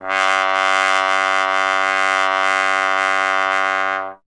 Trombone F#; Miroslav Vitous - Solo Instruments VOL-1

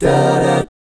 DB13B5, GUY CHORDS, Vocal, Jazz; ILIO; Vocal Planet VOL-3; Jazz & FX

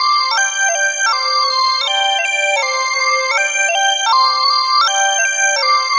Synth Melody; Eclectic Electronica

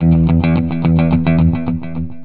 guitar, stringed instruments

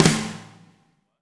WRM SNR, WARM+FAT KIT; Spectrasonics - Burning Grooves CD2

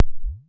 vermona drm1 mk3 fx

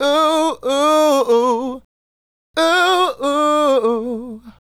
Male Solo, Hip-Hop and RnB Vocals

